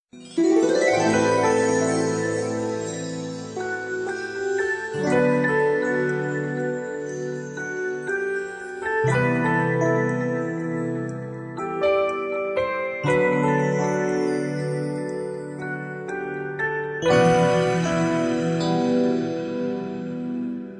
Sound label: relaxing